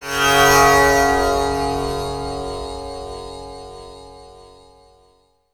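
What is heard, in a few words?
tampura drone; Spectrasonics; Heart of Asia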